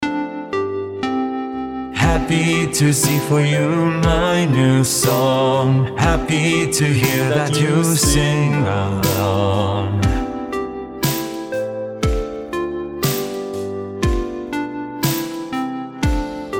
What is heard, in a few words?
Sampler Synth Harp; Unify Guru; Noa hex